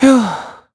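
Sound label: Vox Sigh, Korean; voices; heroes; Evan; kingsraid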